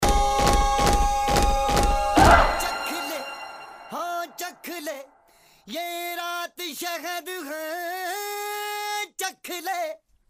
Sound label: Voice